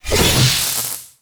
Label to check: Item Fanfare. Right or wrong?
Wrong